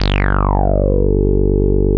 G2, Bass; CRANDLE; E-MU Producer Series Vol. 6 – Analogia Project (CD 1); Boom da Bass 1

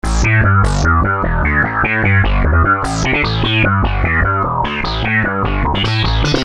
Syn-Bass m Em, Techno Synth Loops, 150bpm; Sonic Foundry (Sony Creative Software); Signals I

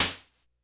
Snare, Korg DS-10

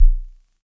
Subbass, Univox SR-95

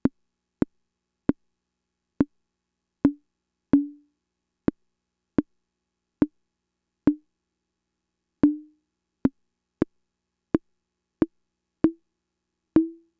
Fricke MFB-522 CONGAS

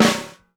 PRESS, CHRM TAMA; Sampleheads; New York City Drumworks VOL-1

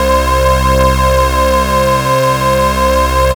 Synths Note C

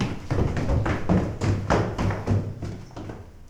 FOOTSTEPS, Science Fiction; Mower Man

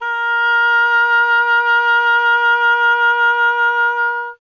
oboe; Miroslav Vitous - Solo Instruments VOL-2

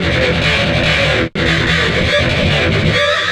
GTR, Chemical Beats

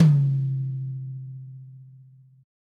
DW Red Maple Tom Room; 2002 DW Red Maple Kit; One-Shots; Sonic Foundry (Sony Creative Software); Vital Drums

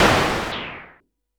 Perc Hit, Noise

